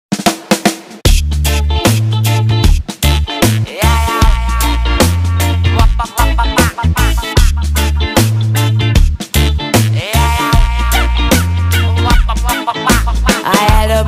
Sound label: bass boosted